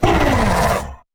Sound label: hurt, creatures; combat; ryuchi; CosmicRage